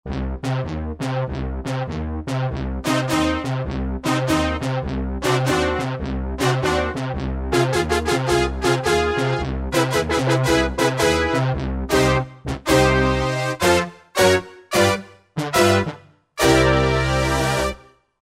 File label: Brass, Roland JV-2080